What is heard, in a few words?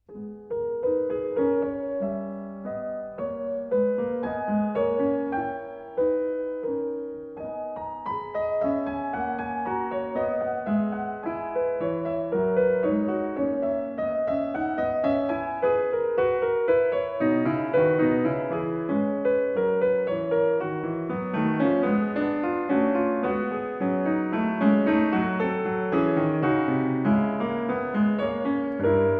Prelude No. 18 in G-Sharp Minor, BWV 863; Bach; The Well-Tempered Clavier; Book 1